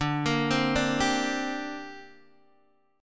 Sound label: D 7th Suspended 4th Sharp 5th, down, Guitar, DADGAD